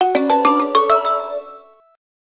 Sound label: Light Steps; Fujitsu Arrows-F-04H